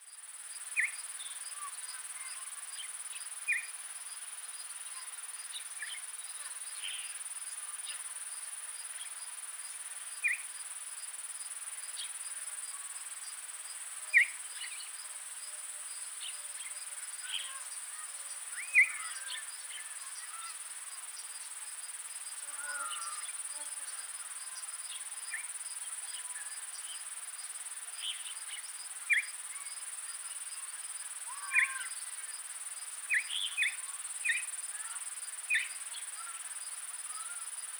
Neumann's starling

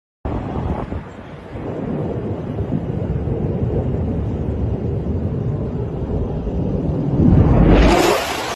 Breaking sound barrier

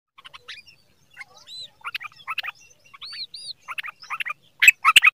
common nightingale female; برای جلب جف; هزار دستان ماده

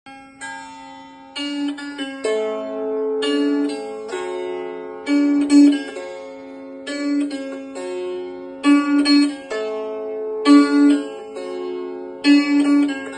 Kantele; کانتله

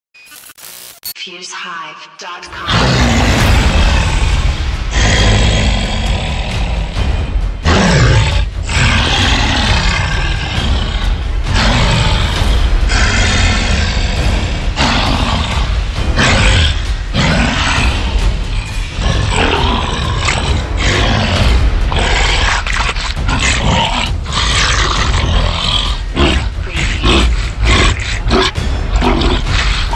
Troll Monster; هیولا ترول

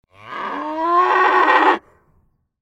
cow sound

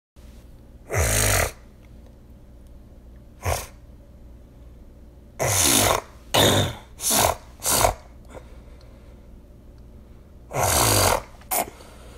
nose sniff

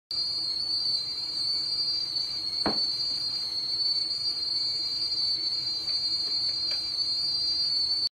Water kettle whistle; سوت کتری آب

